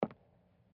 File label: Footstep; GlobalGameJam 2021